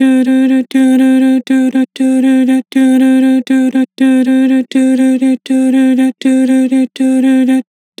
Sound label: Vibrato "Doo", Vocals; Harmor Vocals